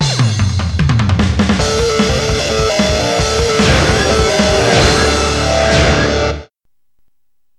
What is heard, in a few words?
Countdown; Custom Robo Battle Revolution original soundtrack